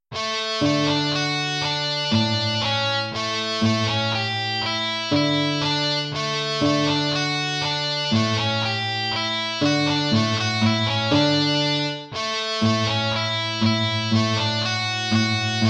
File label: waltz; Carulli Ferdinando